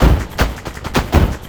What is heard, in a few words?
LOOP, 160 BPM, Drum & Bass